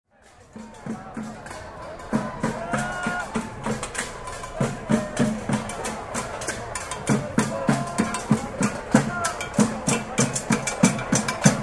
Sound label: great percussion; May Day